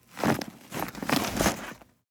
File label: bag close; anemoi